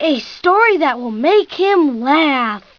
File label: laugh; Putt Time